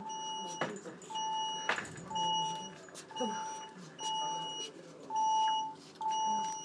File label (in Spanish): alarma de bateria de bomba de perfusion